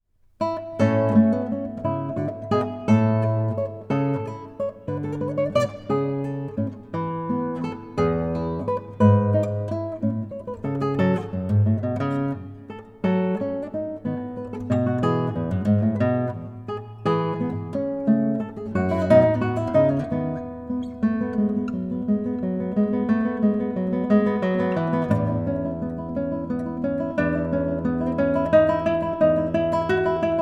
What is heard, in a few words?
guitar